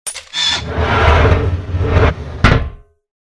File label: Metal sliding door